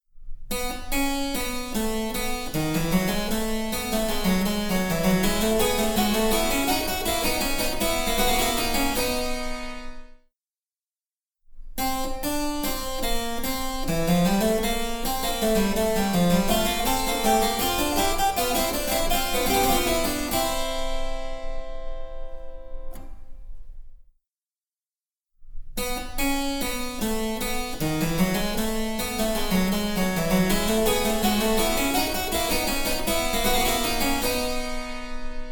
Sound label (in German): Cembalo; Mark Lindley WTC 1